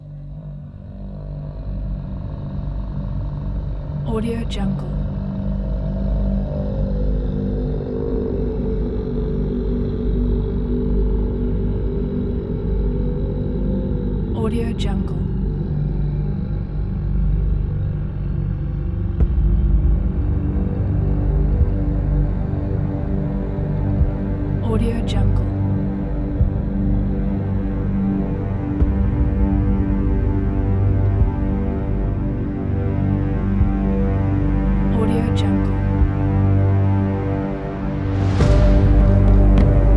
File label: viking dramatic